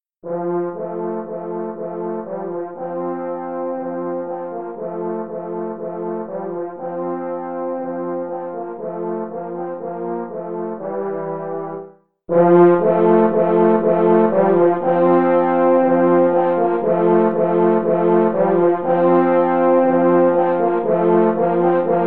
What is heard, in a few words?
Easy Classic Duets, French Horn